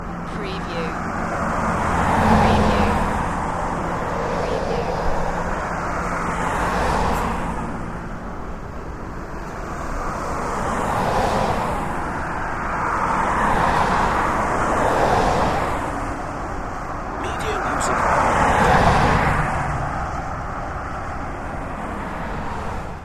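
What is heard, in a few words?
Cars Traffic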